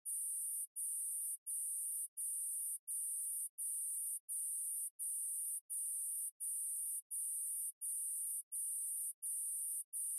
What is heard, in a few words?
Alarm Security